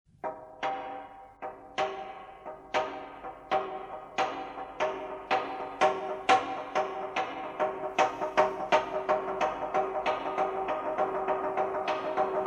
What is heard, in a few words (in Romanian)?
toaca si clopotele